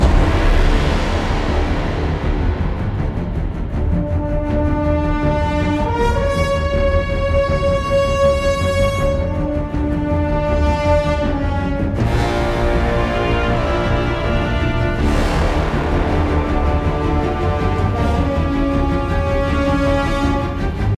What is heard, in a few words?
Defeat Dying Loop 80BPM; DBNO